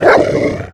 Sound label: death gnoll, creep deaths; dota